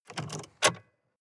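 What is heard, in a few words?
sports car interior key pull out short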